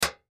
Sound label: MedicalScientific; Soundfx III